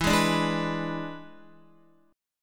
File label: E 7th E down Guitar; E7 strummed; JGuitar